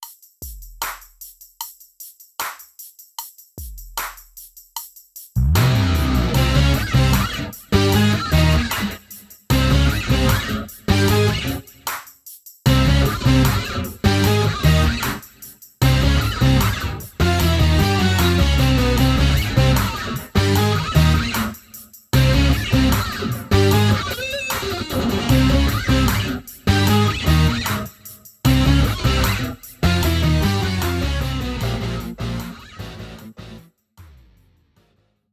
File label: Rock No Drums 152bpm; Groove Jammer